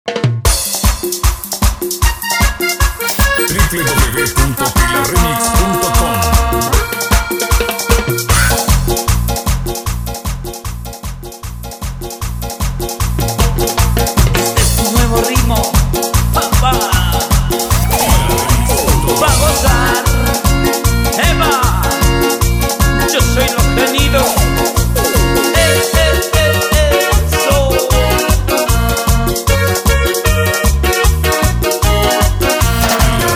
Intro Percusion

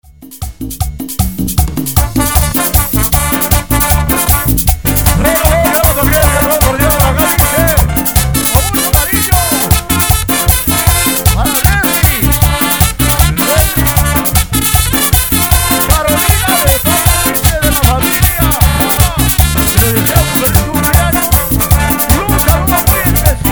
Intro Banda en Vivo, Bpm 156; SanjunitoBass

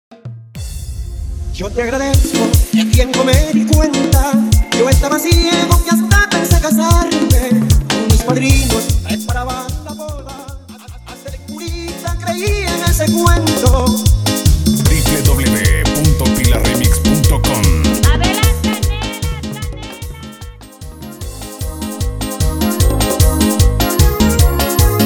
Instrumental

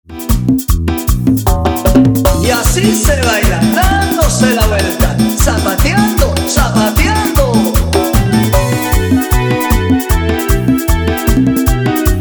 COVER, BPM 153; DIMAX DJ MIX

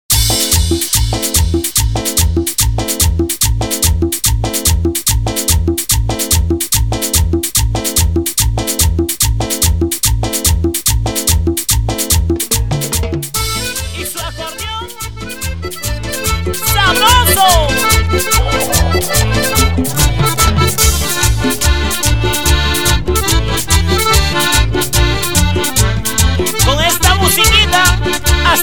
Intro Simple Bpm 145

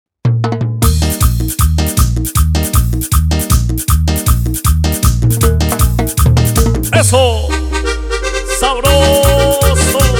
Instrumental Animado, Bpm 157

